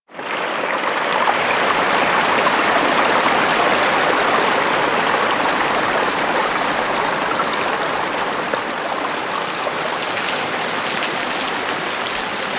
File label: SFX Waterfall; Warframe; Orbiter Nursery